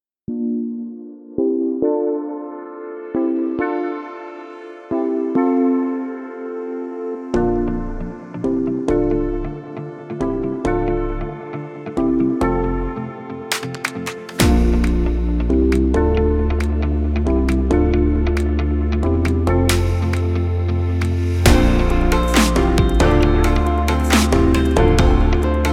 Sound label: flying and uplifting